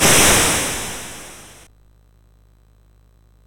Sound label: sid MOS6581R4; Hard Hat Mack